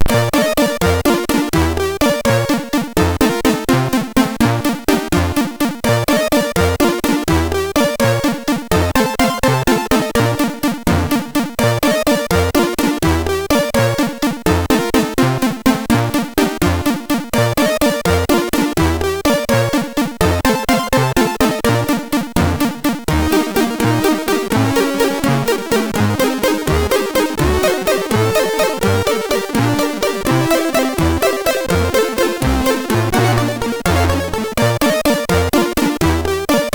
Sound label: Moody, MOS6581R2; sid; soasc